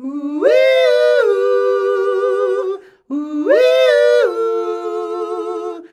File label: UUIIUUHUU, MALES; Best Service; Hyper Dance